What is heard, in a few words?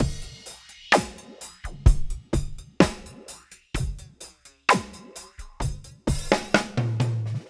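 64 bpm, beat loops; Zero-G; Monster Beats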